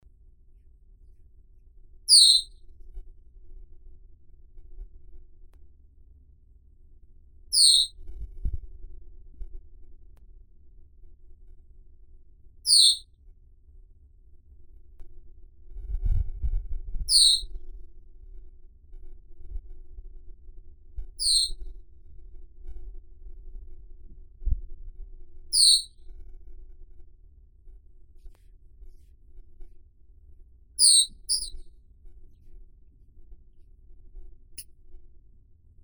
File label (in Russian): drozd lilovii myophonus caeruleus